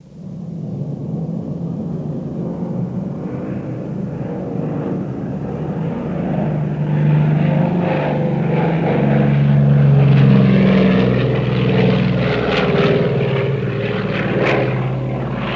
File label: B29 Bomber By Med Spee, b 29 superfortress